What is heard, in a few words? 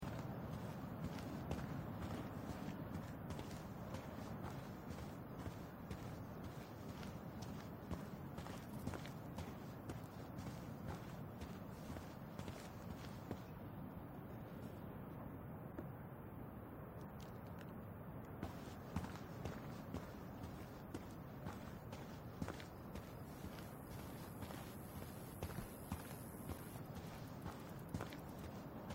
walking desert hintergrund; Life is Strange 2; gamesoundopedia